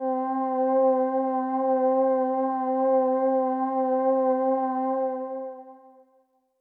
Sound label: Pad C, Synth Prophet One Shots; Electronisounds